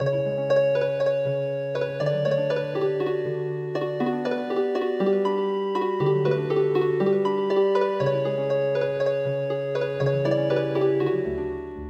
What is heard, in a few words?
Healing Harp